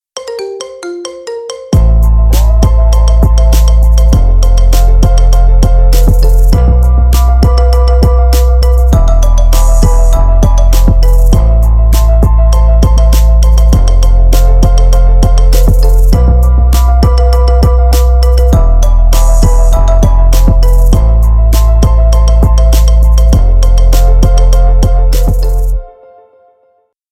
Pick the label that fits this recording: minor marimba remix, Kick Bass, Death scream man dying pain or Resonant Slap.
minor marimba remix